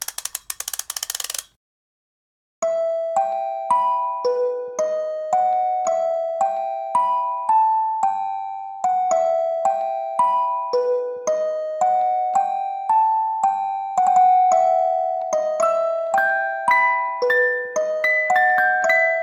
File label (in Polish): pozytywka; Płyty muzyczne